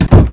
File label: DOORS ESCAPE ATTIC CLOSE; don t be afraid the first toy